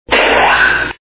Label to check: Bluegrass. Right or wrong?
Wrong